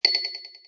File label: ice ping